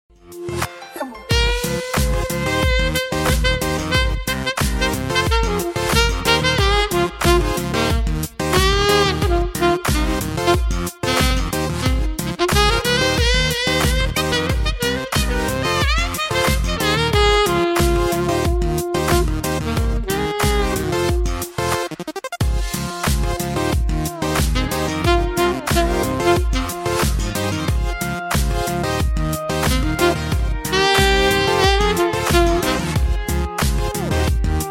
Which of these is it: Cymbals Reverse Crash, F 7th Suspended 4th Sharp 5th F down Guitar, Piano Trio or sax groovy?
sax groovy